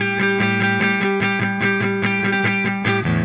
Silos Clean Strum Guitar, Indie Rock

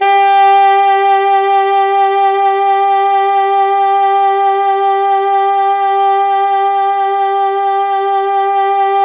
Pop Brass; Best Service ProSamples vol.19; AKAI

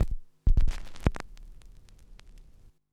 Record FX; Zero-G Smoke

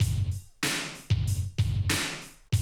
Classic DrumLoops

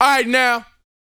VOX F, Vocal Planet R&B & Dance HIP-HOP; ILIO

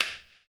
CLP, CLAP; ILIO - Ethno Techno

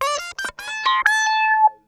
SQUEEZER, Funk Guitar; 90 s Sample CDs; Zero G

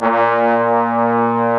bones, sweet brass; E-MU Producer Series Vol. 2 – More Studio Essentials; Composer

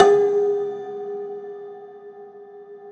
Rubbery Piano, prepared piano; Total Piano

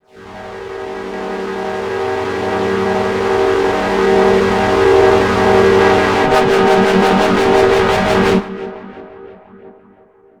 Pitch Swell; KJ Sawka - Drum n Bass & Beyond; Swells

